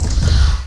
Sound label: INTCLICK